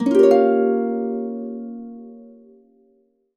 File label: HC GDIM7, Complete Harps; USB Soundscan vol.62; AKAI